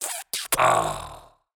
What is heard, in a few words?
Victory; portal quest android